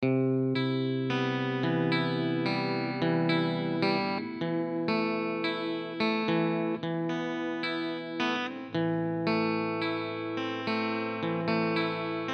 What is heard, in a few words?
strato wet arpe; Chiqui Audio; Arpeggio 26 110 Bpm C Maj; Fender Stratocaster